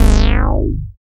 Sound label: BAS MKS-50, Roland